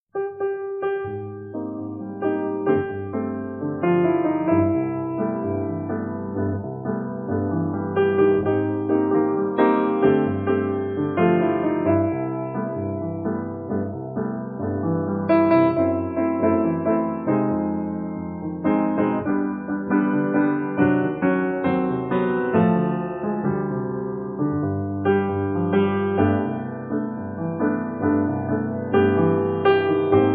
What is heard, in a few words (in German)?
solopiano